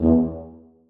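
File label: tuba staccato e2; Sonatina Symphonic Orchestra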